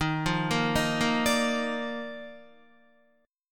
Eb Major 7th Suspended 2nd Eb Guitar 4E 4A 5D 5G 5C 6F; EbM7sus2; arpeggiated; JGuitar